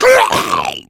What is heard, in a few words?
hunter pain, zombie; Left 4 Fortress